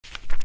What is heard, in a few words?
flip snd